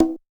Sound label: Low Conga; Roland TR505